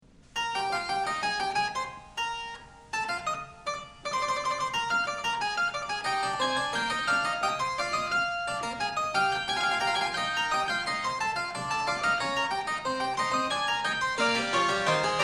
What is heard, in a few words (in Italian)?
fuga; bach; bwv852